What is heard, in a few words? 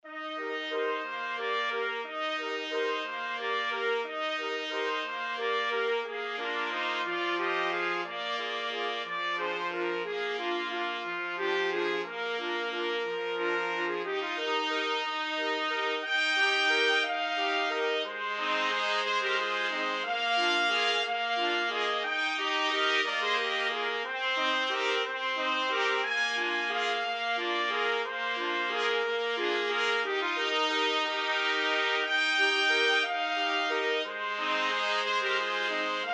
cielito lindo trumpet quartet